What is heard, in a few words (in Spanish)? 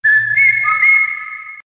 Silbido